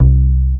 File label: Standup C, One Shot Bass